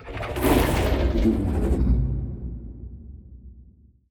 void worms mature attack; Stellaris; grand archive; ships

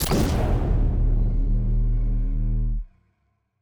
notification death; notifications; Stellaris; paragon

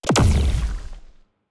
evasion buff hit, weapons; Stellaris; biogenesis

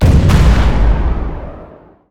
explosion; Stellaris

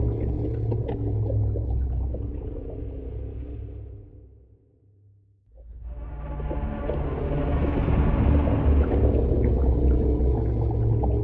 void worms troika idle; Stellaris; grand archive; ships

